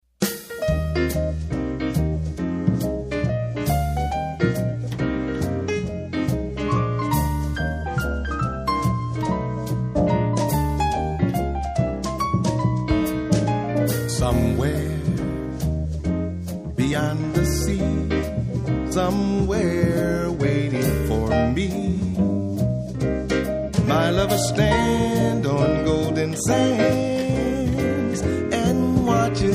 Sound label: Double Bass